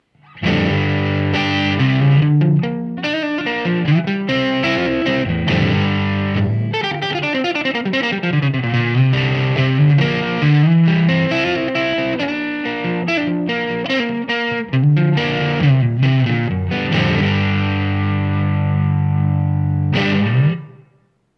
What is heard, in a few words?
TELE NECK; CORNUCOPIA